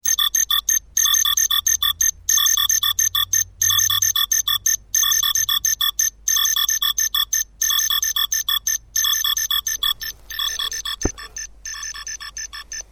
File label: frog box